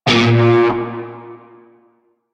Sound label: Shawzin Oct One A Sharp; Music; Warframe; Narmer Shawzin